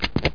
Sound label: plate off; landslor